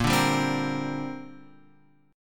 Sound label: Bb 6th Add 9th Bb Guitar Open F; Bb6add9 strummed; x,5,2,2,3,5